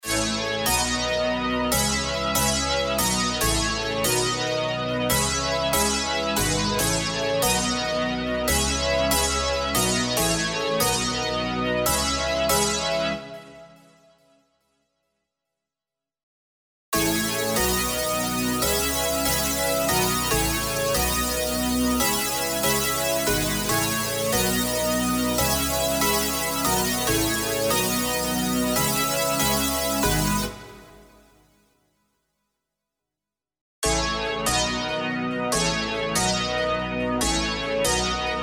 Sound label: Lead Bass Thing, 142 BPM